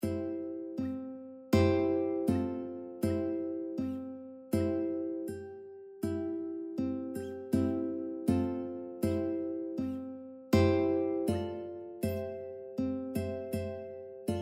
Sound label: worried man uke